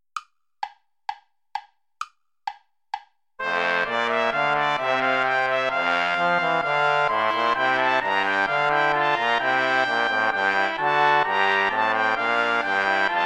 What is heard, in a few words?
ballet play along without trombone; praetorius michael; ballet des feus